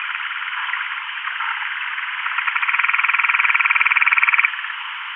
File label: Ophidion marginatum Chorus, fish; Soniferous Fishes of Massachusetts